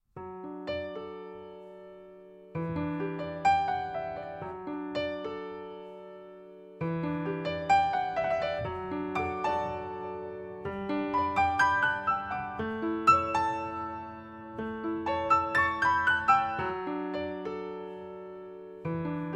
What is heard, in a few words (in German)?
Psalm D-Dur